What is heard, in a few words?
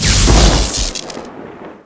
lightning judgement; npc